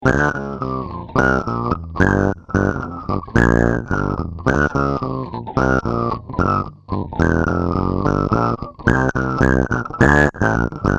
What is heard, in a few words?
Bass Balls